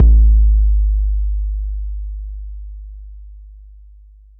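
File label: bass drum 808 Moog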